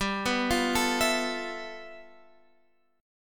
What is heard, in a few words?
G 13th G Guitar Drop D, chord